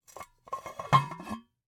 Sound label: Cookie Jar, ceramic, glazed, irregular shape, hollow base, hollow top, rough, resonant, tonal, open; stutter; Eiravaein Works; Vaeyan IV